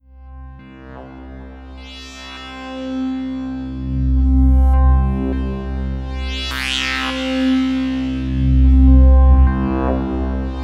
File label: electric drone